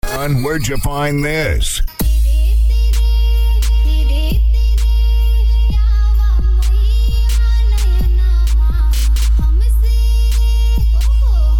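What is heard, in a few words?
weighty BASS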